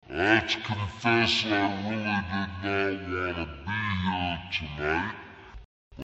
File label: magnitude low pitch